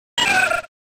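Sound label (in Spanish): Grito, Pokémon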